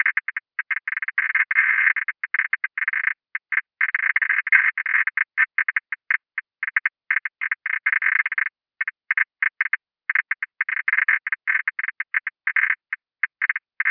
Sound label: cracks